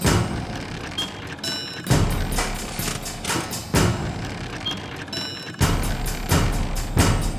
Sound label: steampunk suspens rythm; mountain world